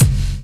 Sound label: BD FAT; E-Lab; X Static Goldmine 2; akai